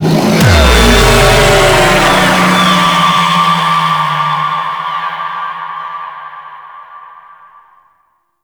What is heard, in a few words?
Vocal Defend Song; Enemies; Vocals; Warframe; Teralyst